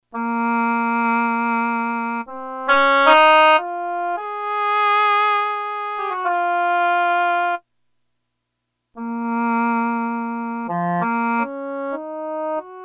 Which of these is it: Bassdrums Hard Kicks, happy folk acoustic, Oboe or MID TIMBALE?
Oboe